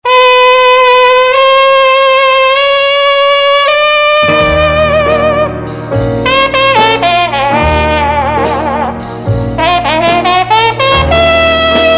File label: TRUMPET